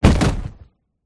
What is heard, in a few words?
OBJECT IMPACT Sand Bag Drop; smartsound